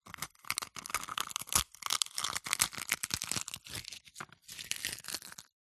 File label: food egg shell break crush in hand; zapsplat